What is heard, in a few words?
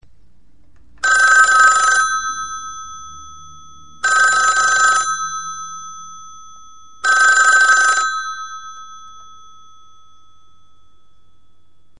US Bell phone sound